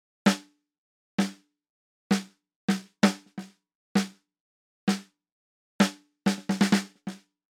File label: drum snare (none)